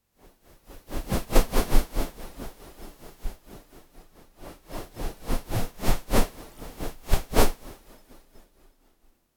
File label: single continuous soft fa, textile